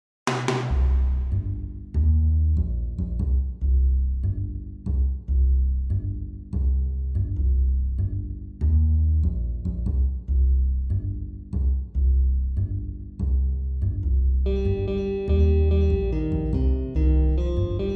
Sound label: melody ends